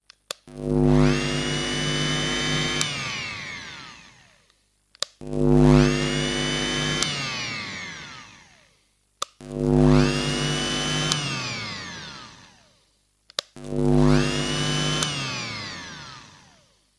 small vibrating saw on off close dynamic; mono; sonniss gdc

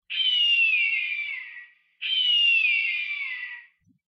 hawk call; weather and nature

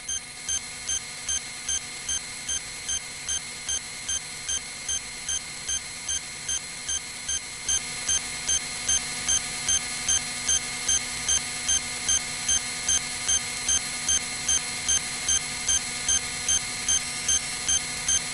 wacom intuos pro tablet data sending; Sonniss GDC

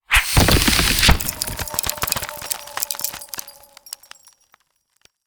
ray of frost, cantrips; psfx

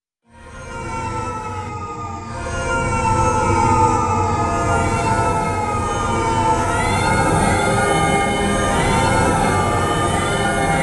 delay movement surreal; sonniss gdc

